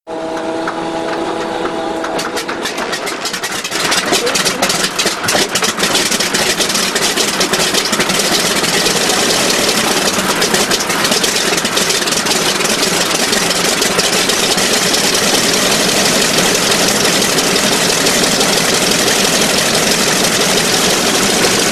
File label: P47 Start Up And Idle